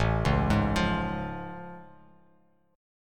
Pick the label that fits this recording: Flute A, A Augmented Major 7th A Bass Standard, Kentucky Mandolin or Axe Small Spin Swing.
A Augmented Major 7th A Bass Standard